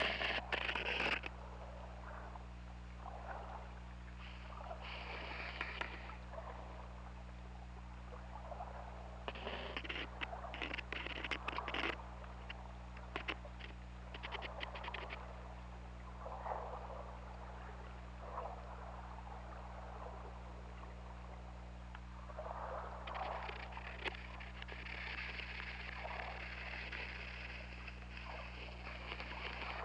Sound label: Orca; OrcaLab